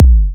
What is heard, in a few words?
house kick drum single shot A key